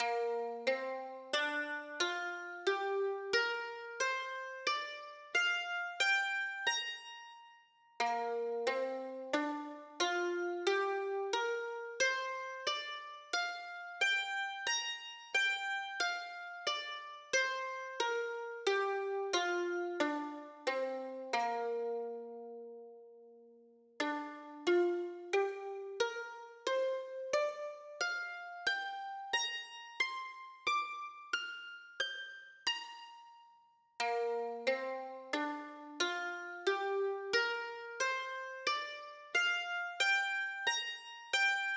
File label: Major Pentatonic; Bb